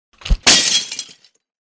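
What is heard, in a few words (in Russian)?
локтем выбивают стекло в окне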